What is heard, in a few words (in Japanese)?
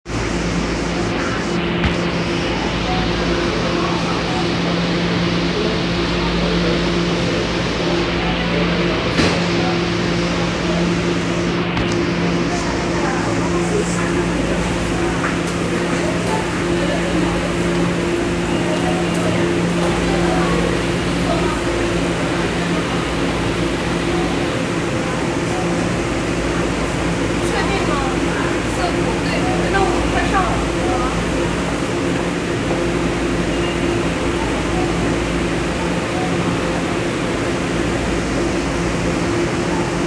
銀座線浅草西2番線, train sounds